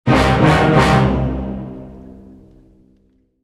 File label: Music default stinger